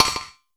SYN FX, PERCUSSION; AKAI S6000 CD-ROM - Volume 5

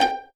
vipiz G4, Violins pizzicato

